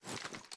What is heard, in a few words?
reload empty drum rotate; reloads; gmod; iw8